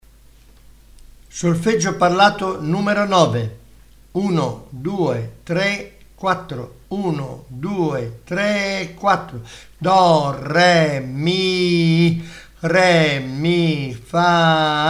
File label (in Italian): Solfeggio Il mio amico flauto